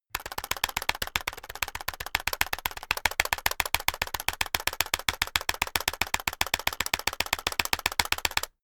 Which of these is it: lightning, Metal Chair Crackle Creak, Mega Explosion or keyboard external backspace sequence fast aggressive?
keyboard external backspace sequence fast aggressive